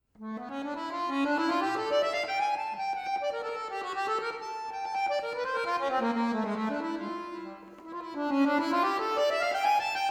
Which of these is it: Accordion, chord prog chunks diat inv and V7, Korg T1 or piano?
Accordion